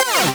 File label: Synths Root C